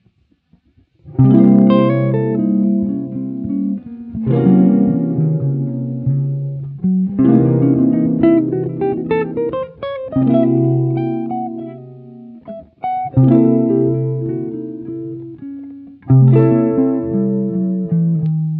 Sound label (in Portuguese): chords scale, escala diminuta dominante semitom tom